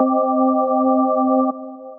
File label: Synth Keys; Horizon Ascend